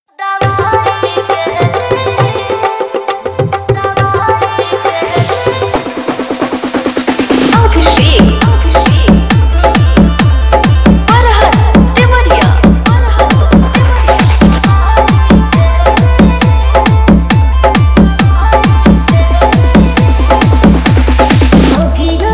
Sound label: Dholki Mix; Heart Fail Vibration